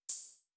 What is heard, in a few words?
Drum Loop 122 BPM Open Hat; Cymatics; Vibes II Drum Loop Collection; Connection Drum Loop